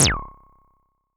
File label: Perc Anime Laser, Vermona Mono15 Drums